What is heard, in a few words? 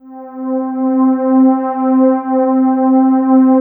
PAD, Drum & Bass, 160 BPM